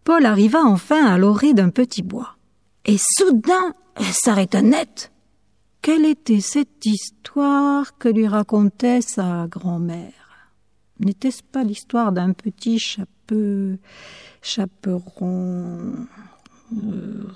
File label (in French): pieces enfantines pour le piano; Le petit chaperon rouge